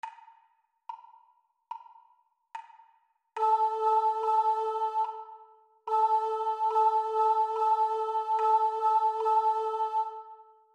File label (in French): Rythme Haut